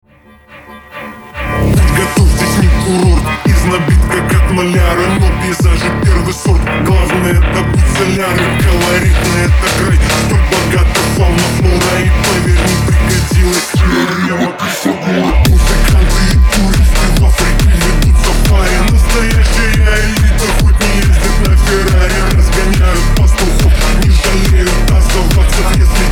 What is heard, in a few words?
Phonk Remix